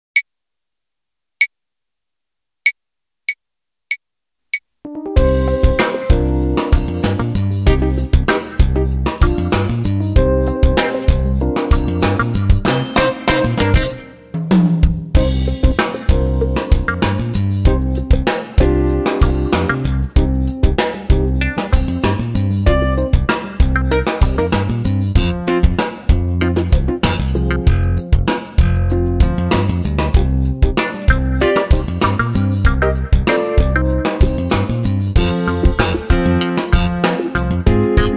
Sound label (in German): Glocke